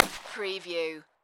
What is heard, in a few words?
Pistol S W P99 40 Cal Single Shot Exterior At 45ft